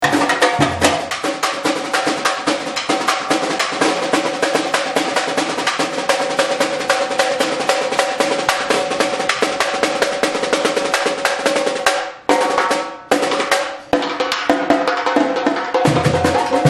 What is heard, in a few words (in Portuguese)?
caixa